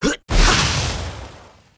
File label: Wizard M attack